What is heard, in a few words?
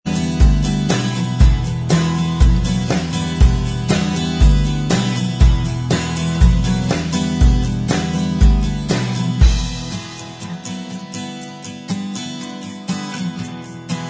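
acoustic Instrumental Track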